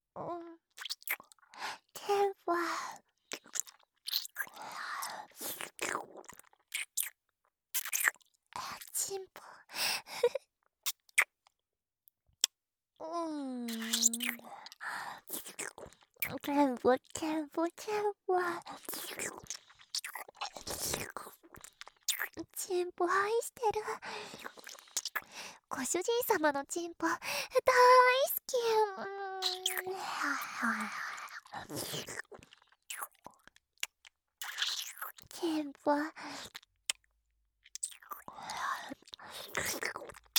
キス, ASMR; オナサポ

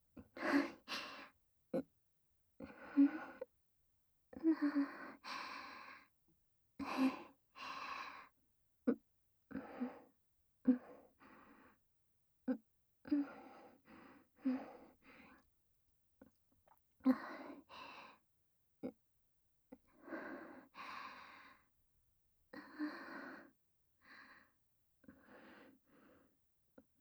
ぬちょぬちょクリーム手コキループトラック, ASMR

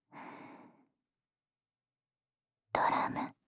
ドラム, ASMR; 【無声音ASMR】シャッフル睡眠法で眠ろう【バイノーラル音声】; シャッフル睡眠ランダム再生用

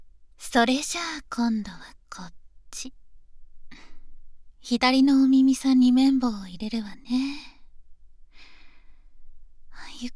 耳かき（左耳）, ASMR; 保険の先生と過ごす甘々密着性活～耳かきからオホ声まで～

